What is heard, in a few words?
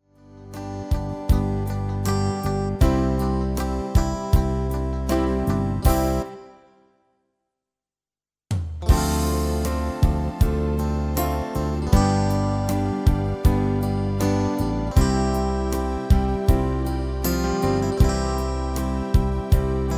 MIDI